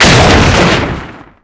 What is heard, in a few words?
jeep engine destroy; WAR